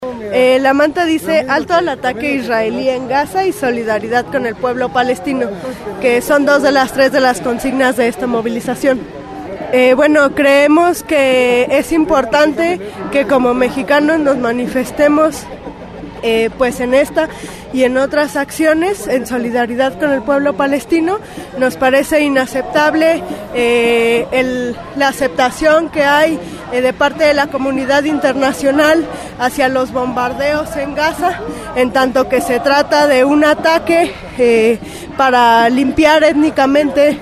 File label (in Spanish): marcha; palestina julio 2014; alto al ataque en gaza